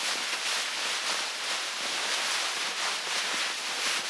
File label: eraser loop; props